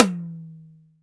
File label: TAMA TOM, DRY KIT; AKAI S6000 CD-ROM - Volume 1; DRUM RYTHM